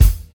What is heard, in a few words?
raw kick drum sample F sharp key, Royality free steel kick drum sample tuned to the F# note; kick drum samples